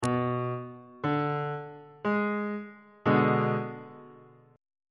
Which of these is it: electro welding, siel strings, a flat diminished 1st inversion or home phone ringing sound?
a flat diminished 1st inversion